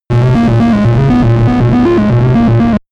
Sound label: game arcade underworld